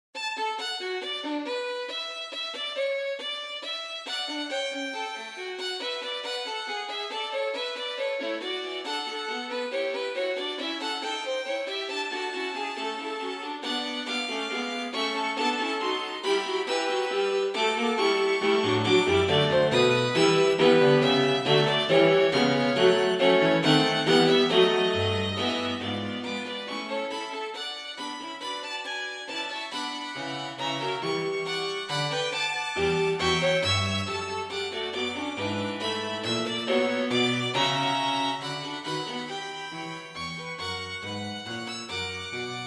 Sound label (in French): Fugue 2 Violons 2 Alto 1 Violoncelle; Ludwig van Beethoven